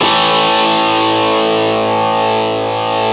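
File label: GTR CRUNCH, Metal; Power Chds; Club-50 - Foundations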